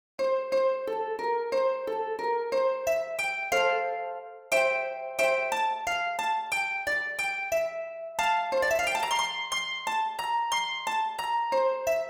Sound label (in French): Harpe